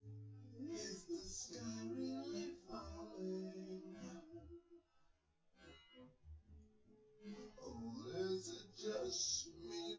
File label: no drums, synth conv, 250ms, 1m; SiSEC10; underdetermined